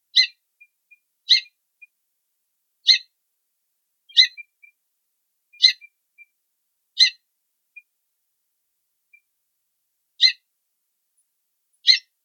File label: Limosa haemastica - Becasa de mar